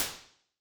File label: Drum Room - sharp attack, short reverb tail